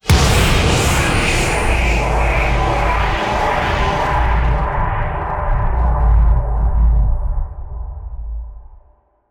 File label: Tension Builder Impact